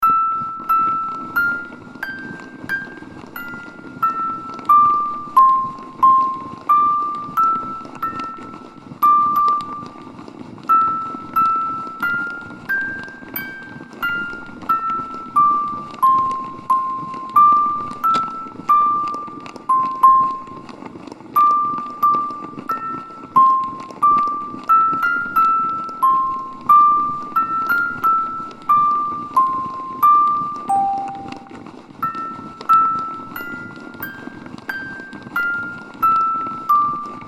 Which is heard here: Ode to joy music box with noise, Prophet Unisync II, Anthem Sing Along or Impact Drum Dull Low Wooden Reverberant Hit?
Ode to joy music box with noise